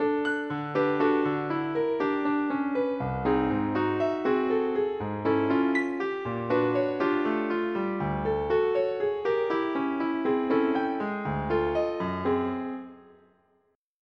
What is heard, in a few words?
music fast